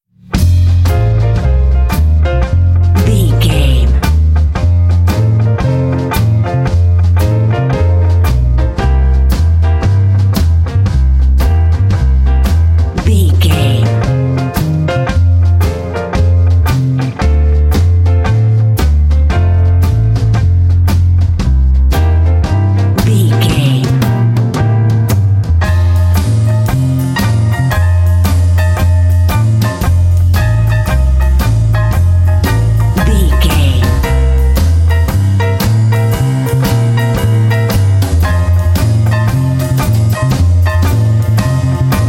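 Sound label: lets slide dobro bed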